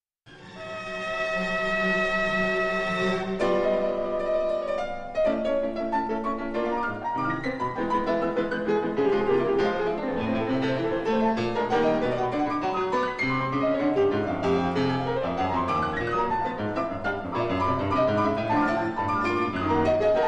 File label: Piano Concerto finale